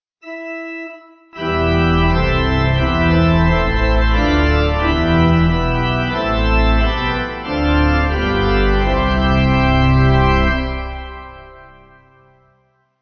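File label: Embolism Pipe, Pipe Organ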